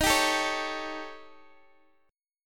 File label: D sharp 7th Flat 5th, Guitar, Open D